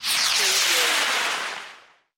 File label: Rocket Launch Pass By; sound effects bible